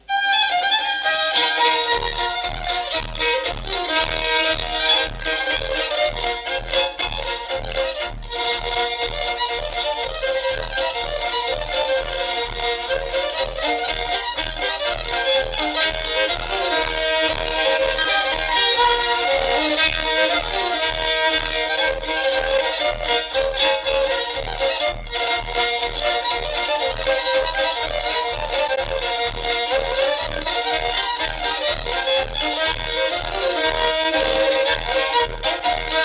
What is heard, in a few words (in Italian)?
due violini; ruggero con due violini